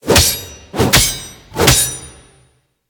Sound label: Victory Attack; Susano; Smite